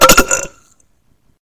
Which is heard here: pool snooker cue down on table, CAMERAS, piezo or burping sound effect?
burping sound effect